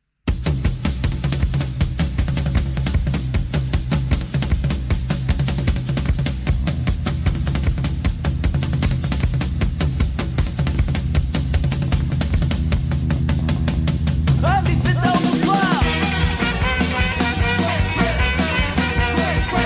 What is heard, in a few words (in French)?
Rock et Salsa